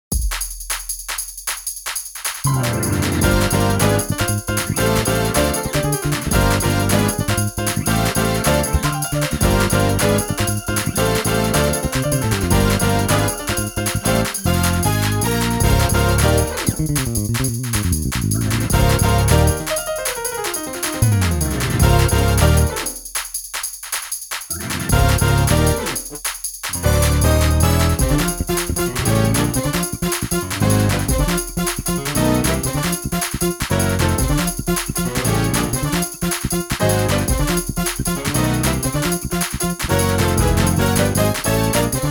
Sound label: drumless